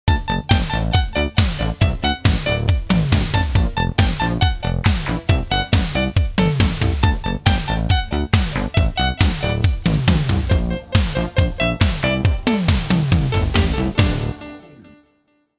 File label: Crumar BOX